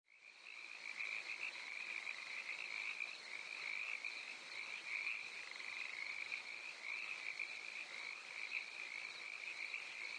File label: California Frogs, crickets